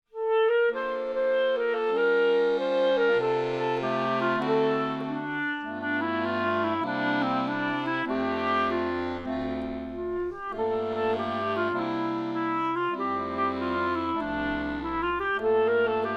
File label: playbacks; barouf; bel apres midi